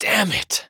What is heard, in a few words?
battle pain; dqxi; erik